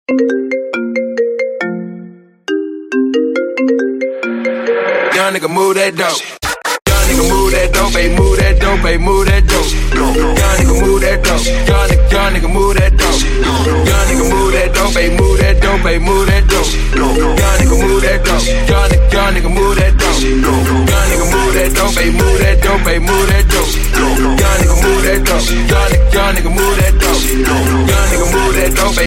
Iphone remix

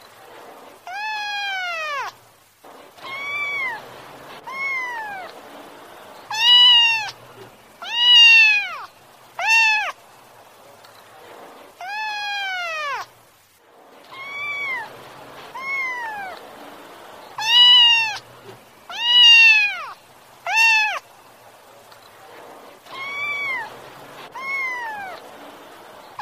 kitten crying

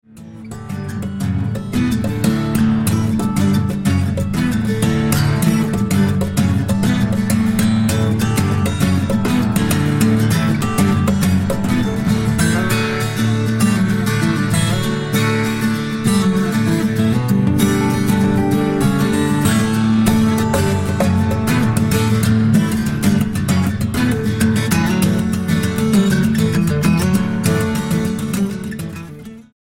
dueling guitars

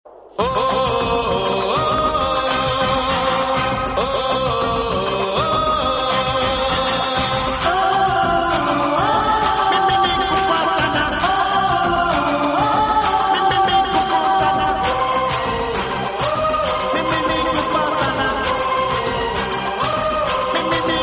Music

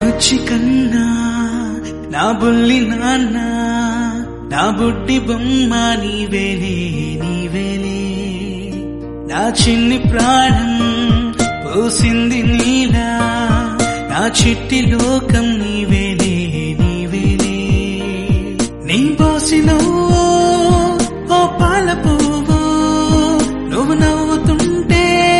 Birthday Telugu

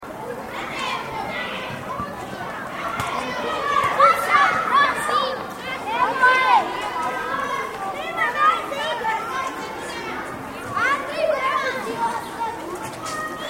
kids playing football

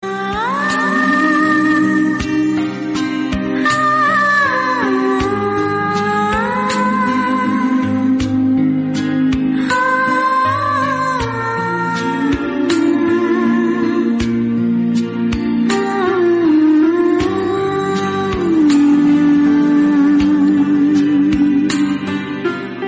Background Music; Kasam Tere Pyaar Ki Season 2

